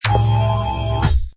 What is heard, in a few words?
Hi Tech Panel Servo Button